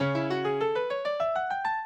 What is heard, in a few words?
minuet, mozart